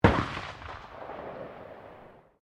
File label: RIFLE UNKNOWN FIRING DISTANT; Rifles, non military; Rifles (Sound Effects:Guns)